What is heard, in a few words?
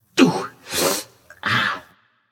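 nonverbal ouch; smite; voicepack; merlin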